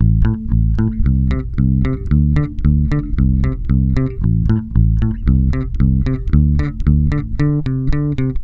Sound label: BUS BASSE; USB Soundscan vol.32; AKAI; Roots Disco